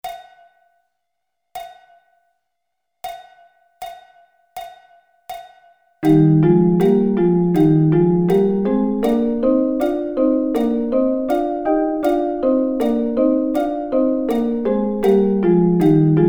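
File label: E flat Double Stops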